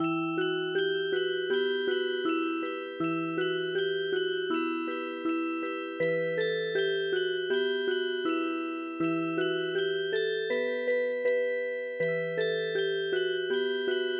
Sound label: canon Music Box; ハンガリー民謡の「蚊のカノン」に癒し系のアレンジを施したオルゴール曲を聞くことができます。「蚊のカノン」は、スローテンポな4拍子のハンガリーの童謡です。